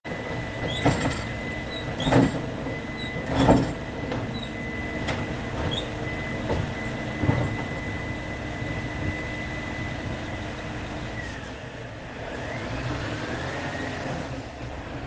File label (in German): Muellabfuhr